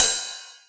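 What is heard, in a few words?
Boss DR-202 cymbal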